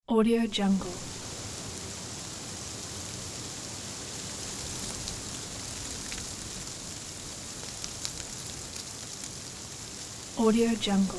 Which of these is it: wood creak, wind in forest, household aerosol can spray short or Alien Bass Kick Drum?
wind in forest